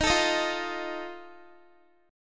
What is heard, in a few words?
Eb Suspended 2nd Flat 5th Eb Guitar 4E 4A 5D 5G 5C 6F; Ebsus2b5; strummed